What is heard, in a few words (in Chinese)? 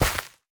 缠根泥土踩踏声